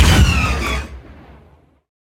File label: cannons